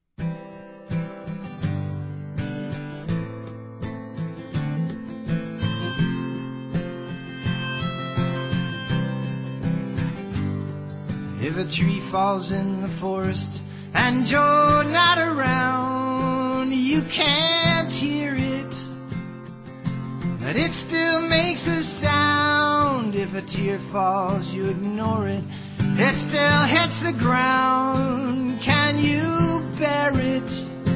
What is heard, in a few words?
Acoustic